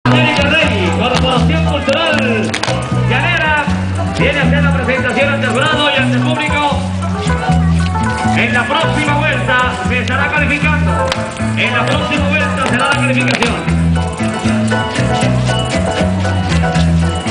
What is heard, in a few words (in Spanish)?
Joropera